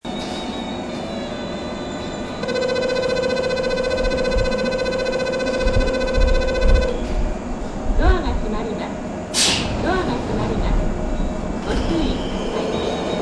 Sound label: bell, osakaloop; imamiya; jrwest